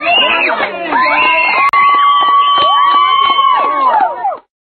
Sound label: college kids cheering after graduation